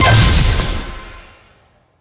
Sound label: Level Win Star; fowl